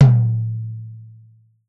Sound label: Tom Mid